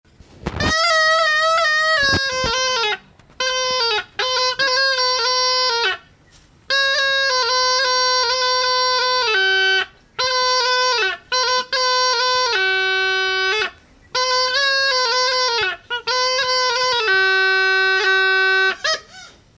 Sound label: Muri Jangkek